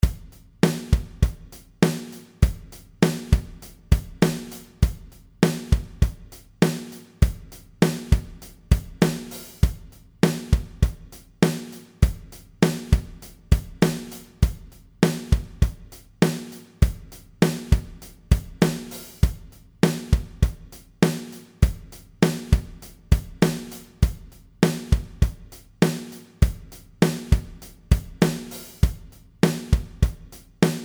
Rock Drums 100; backing Tracks

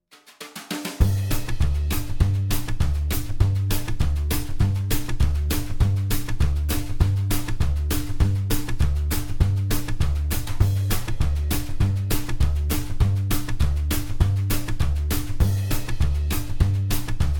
Country Drone G100; drones

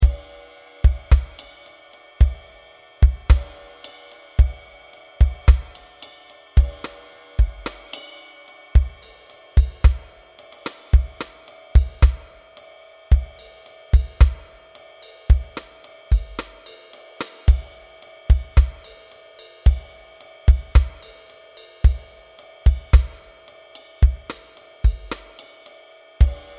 Jazz Rock Drums 110; backing Tracks

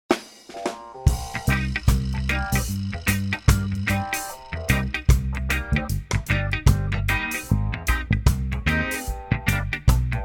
Reggae C min 75; backing Tracks

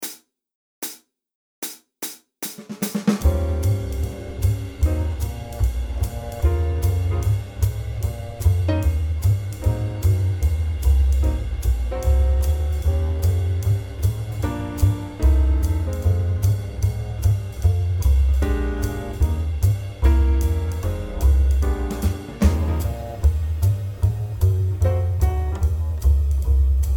Jazz Eb 150, backing tracks

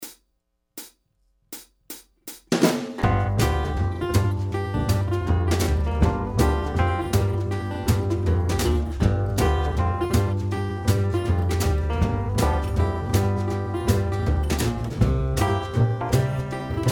Country Blues G; backing Tracks

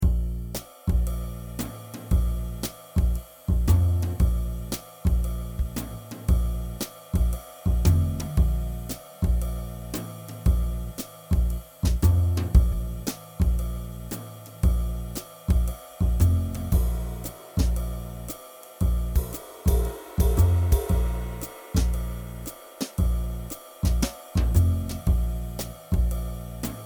Jazz Swing Drone Bb115; drones

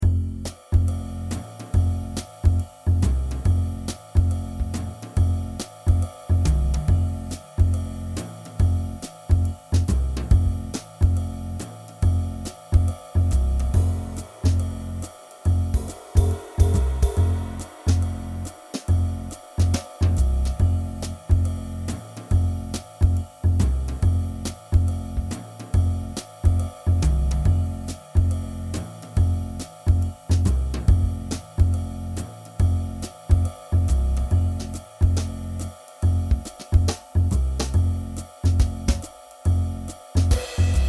Jazz Swing Drone F140; drones

